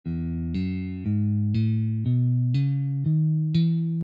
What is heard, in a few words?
Guitar notes